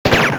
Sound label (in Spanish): disparo crash; valve; deathrun crash2